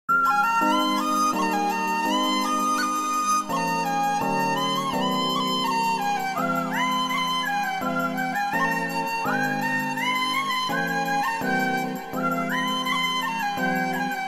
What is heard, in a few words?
love bgm